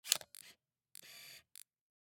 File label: Camera, Pentax KS2, DSLR, electronic, mechanical, ready shot, flash up, focus, adjusts, XY; Eiravaein Works; Vaeyan IV